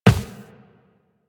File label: Run Default, Footsteps; Warframe; Tenno